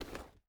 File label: ui pickup rubber; Rust